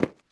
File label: footstep run cement